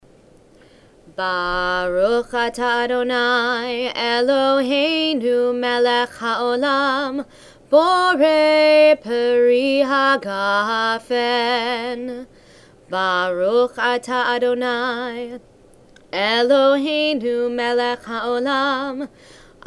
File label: Kiddush